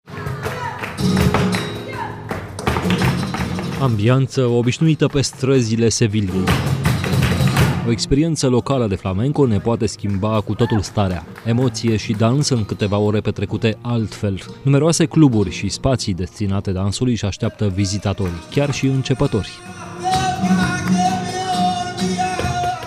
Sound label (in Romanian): Flamenco la muzeul Flamenco